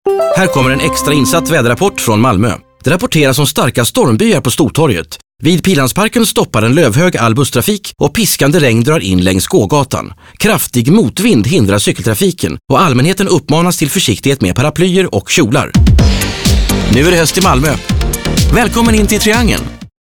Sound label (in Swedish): Triangel Väder Triangelmusik